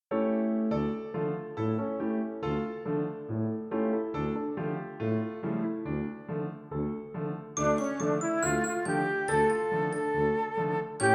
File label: music; furnace